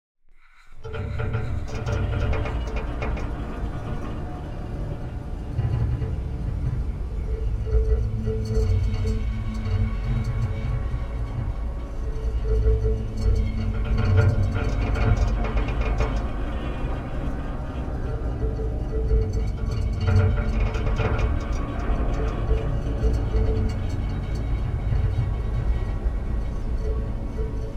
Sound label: Creaking Metal Soundscape